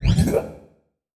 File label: Buttons Mechanical